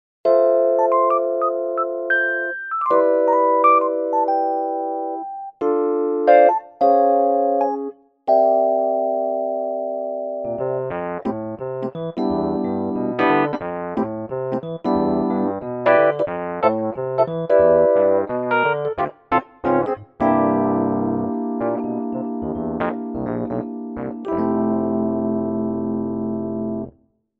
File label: Vivo S7 Stage; Dyno